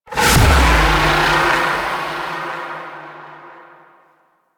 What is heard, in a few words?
Affliction Impact; Warframe; Warframes; Revenant